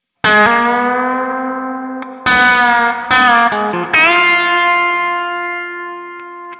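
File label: Intro Lick, guitar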